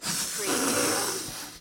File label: Balloon Inflating